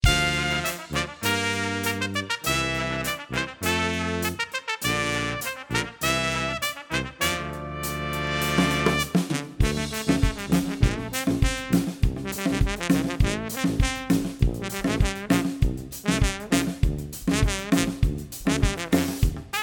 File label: brass